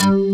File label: HRD PLCK BS, BASSES; Pro-Rec - Synthsonic